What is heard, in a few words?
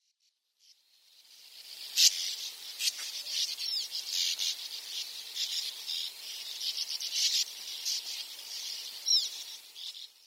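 sand martin, abashiri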